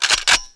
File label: guncock